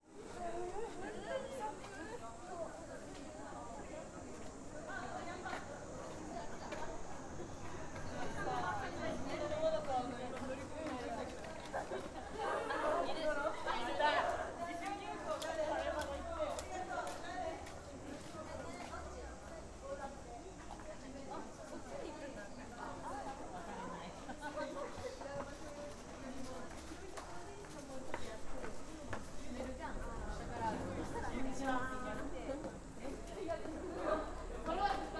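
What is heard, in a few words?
iwaki high, Fukushima Soundscape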